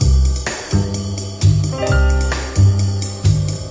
129 groove piano; MELODIC LOOPS; SAMPLED MUSIC LOOPS

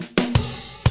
six eight Fill, DRUM LOOPS and BREAKS

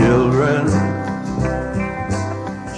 086 children, sampled music loops; MELODIC LOOPS

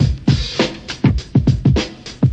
darkrap, 102.5 bpm, drum loop; 101 to 110 bpm

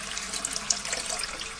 running water; Sound FX Real Life

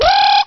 Electro

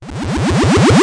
laser reverse wobble

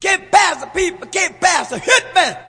hit me, male singing

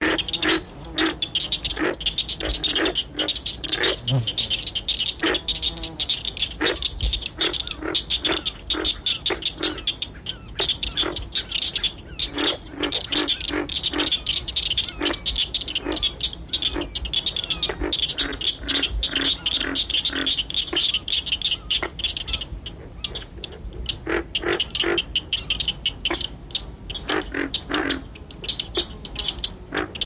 frogs various; Zoo and Nature

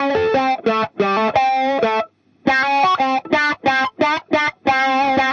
090 tight wakka tone guitar groove; GUITAR LOOPS

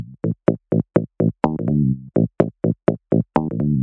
125 narrowpass funkygroove, bass loops

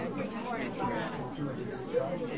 restaurant; Sound FX Real Life

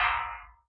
metal decay

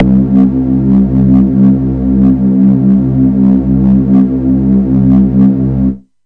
movie drone, Synth

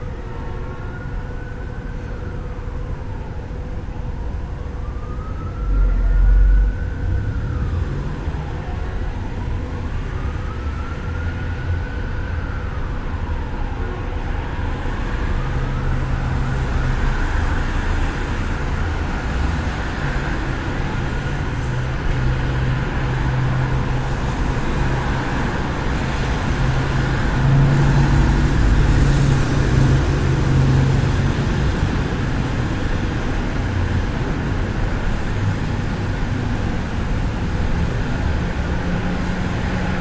city at night; Sound FX Real Life

